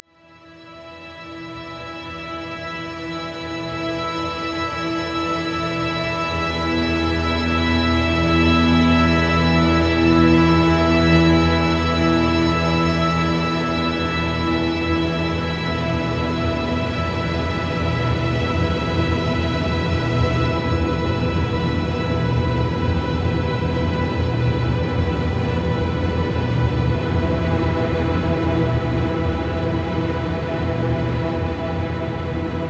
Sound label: Camp Strings D; Torchlight III; PS4